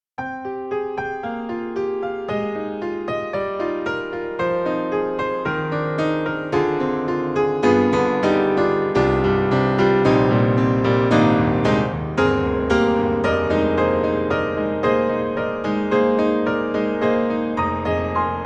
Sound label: Model D Piano and Church; NY; Steinberg The Grand 3